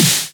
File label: soft hit clap